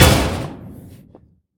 metal collision; gamerip

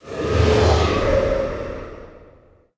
Possession Cast; Fallen Ones Spirit Mausoleum

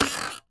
Ladder; Blockman Go; Android

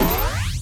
turn on

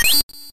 Shining; puchicon; dsi ds

